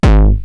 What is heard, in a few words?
Roland TR-909 BD; Drums; BD Selection